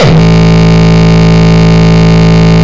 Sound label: Longkick, kicks